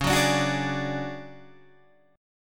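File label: D Major Sharp 11th D flat, chord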